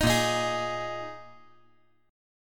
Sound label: A sharp 7th Suspended 4th A sharp